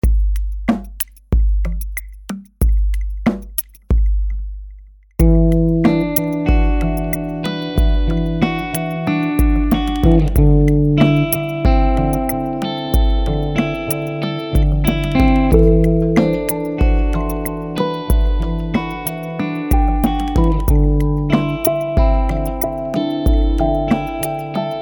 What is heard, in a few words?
perc water shine